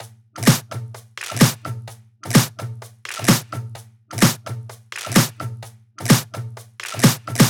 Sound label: 128BPM Brazil Kit; Vengeance Samples